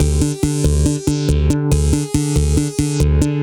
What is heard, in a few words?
Resos A, 140 bpm, D, modular